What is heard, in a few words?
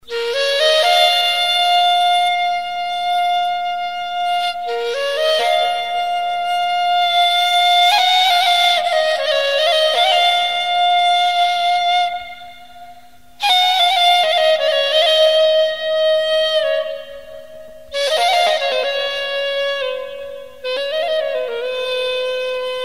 Ney